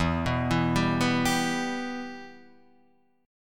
E-Minor; arpeggiated; JGuitar

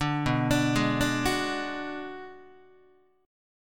B Minor 6th B, Guitar; Bm6 arpeggiated; 5,7,5,6,6,5; JGuitar

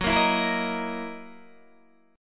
F sharp 13th F sharp down Guitar DADGAD

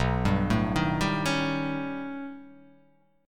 B Augmented 9th B, chord

